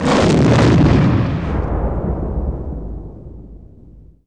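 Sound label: combat explode bwing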